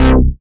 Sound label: Bass C, Offbeat Bass